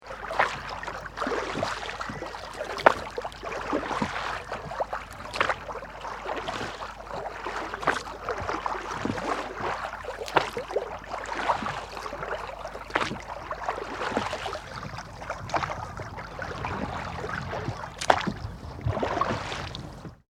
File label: Row Boat Rowing, Oars and water close shot; Boats; Vehicles; Tom Hutchings